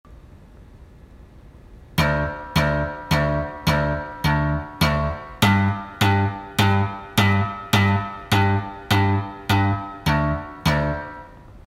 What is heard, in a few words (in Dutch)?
slap bass les oefening; bassguitar